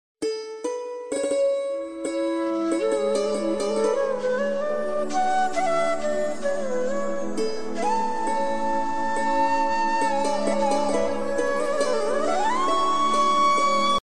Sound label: instrumental ringtone, islamic ringtones